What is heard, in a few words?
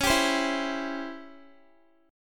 Db Minor Major 7th Flat 5th, Guitar; strummed; x,16,19,17,16,x